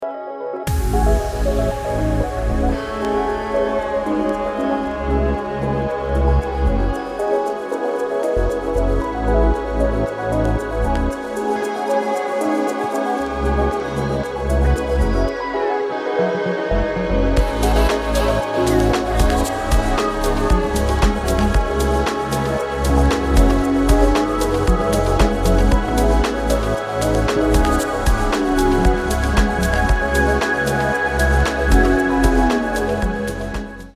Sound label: solo trance